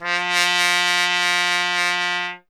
TP F; Miroslav Vitous - Solo Instruments VOL-1; Trumpet

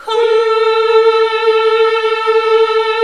HMM A#2, classic vox

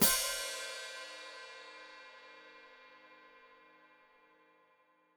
Hat Closed Open, Hi-Hat

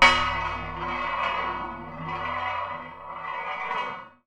Hi hat, contact mic, Marbles; musicradar; contact mic madness; Raw

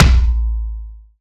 Acoustic Drums - Tom Low; Heavy Acoustic Drums; Antidote Advent

